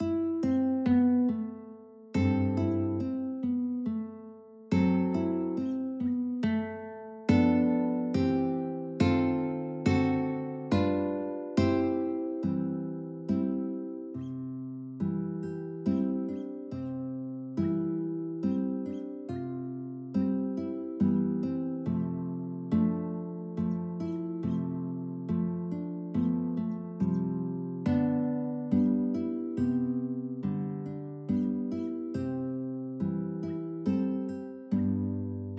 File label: Theme from Piano Concerto duet, guitar duet